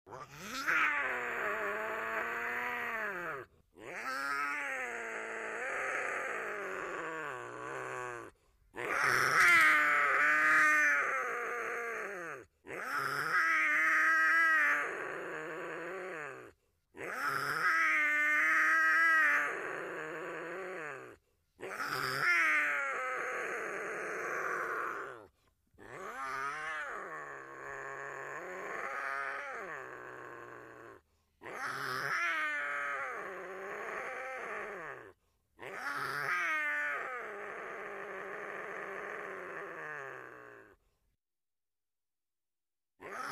Puma Hiss Medium Cu WG, big cats

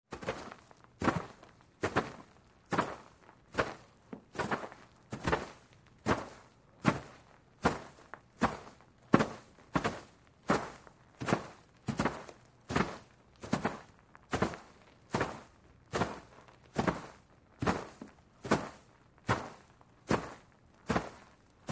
Footsteps Group Sol

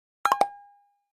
Pop Up Triple Guitar S, pop up element

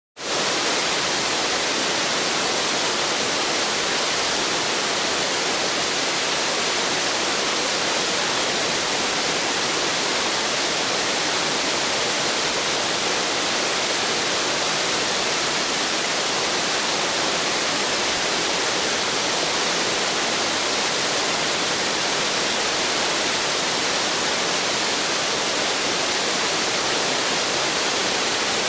Waterfall Large Roar

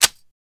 Crossbow Set Click

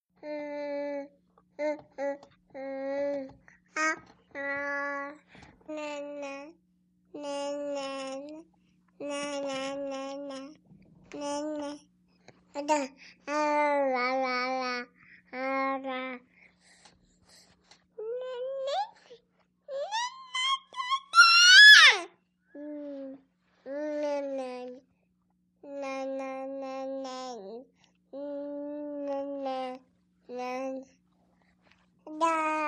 Baby Girl Play Quiet, ten month old

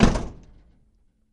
Camaro Door Close Hea, chevy camaro

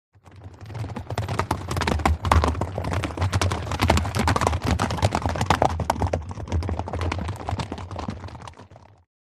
By 3 Horses Past Mic At

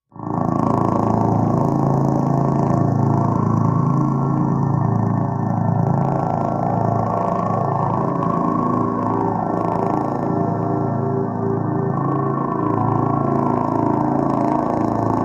Electro, energy beams

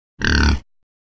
Boar Snort Snarl

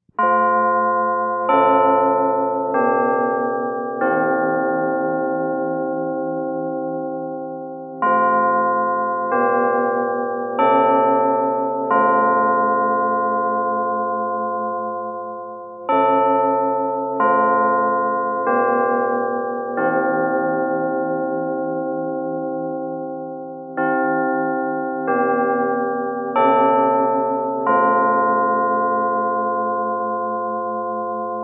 Lg Westminster Clock, bell towers

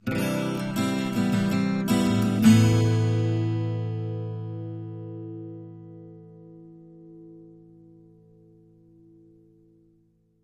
Acoustic Guitar Positiv

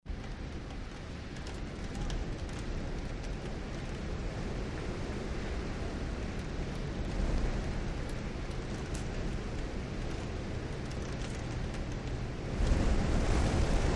Storm Lashing Window

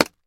Mouse Trap Dull Snap

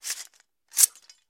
Steel Sword Being Pu; swords

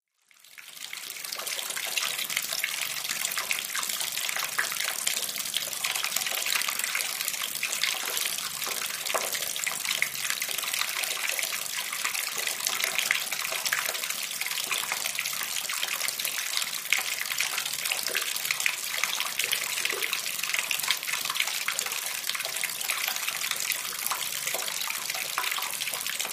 Wash Basin Metal, Medium Stream, Stop

